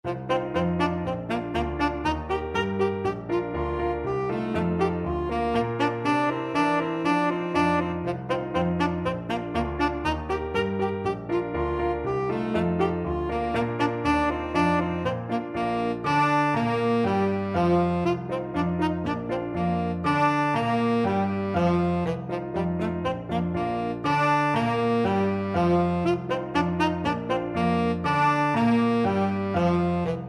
tenor saxophone

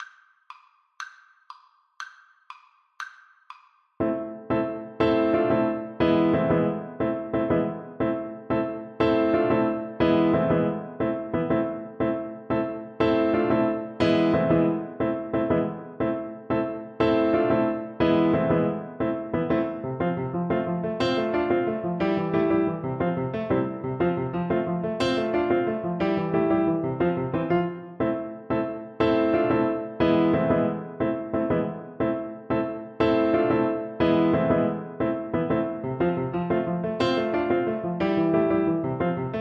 horn, French Horn

